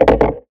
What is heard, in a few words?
Percussive Hit, contact mic; Percussive Hits; musicradar; contact mic madness